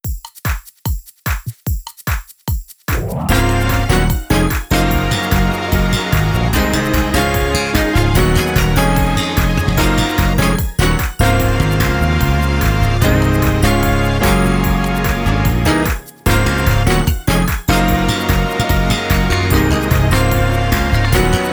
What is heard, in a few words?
Uptempo Gospel drumless